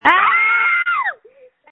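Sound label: Scream, phone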